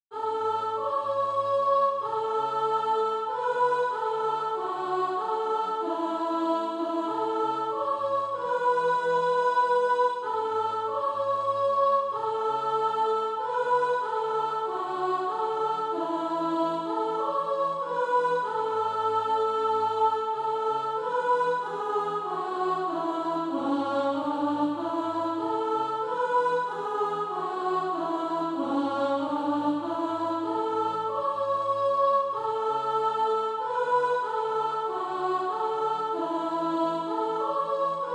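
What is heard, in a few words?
soprano, Practice Music; A Few More Marchings Weary; SATB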